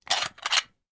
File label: Hand Gun Foley; Sound Ideas; Guns